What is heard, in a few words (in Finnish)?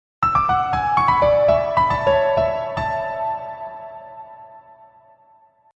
nokia pehmeä piano